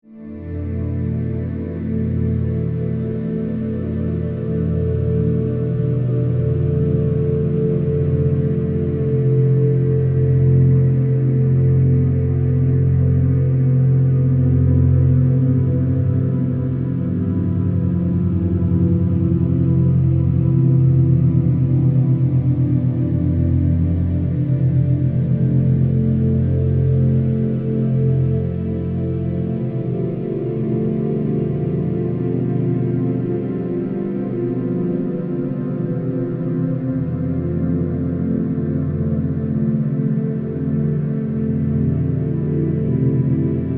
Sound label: pad a soft gentle and relaxing background